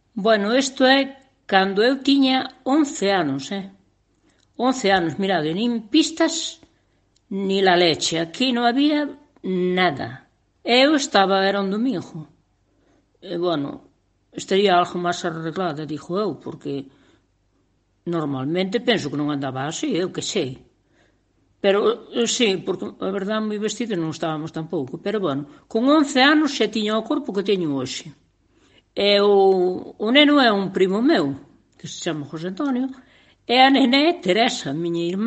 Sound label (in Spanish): canto